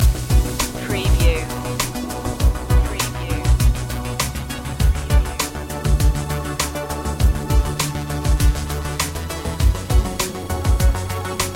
forward thinking loop

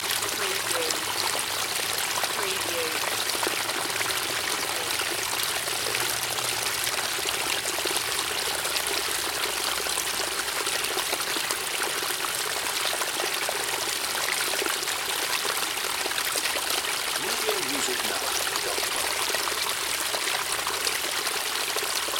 Small Forest Stream

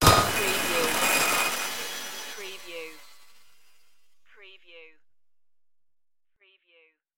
abstract digital morph

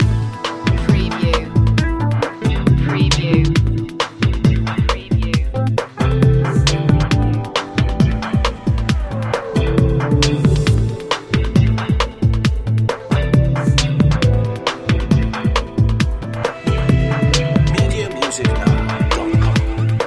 in a good mood loop

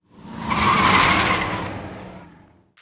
Car Pass By Tires Screech

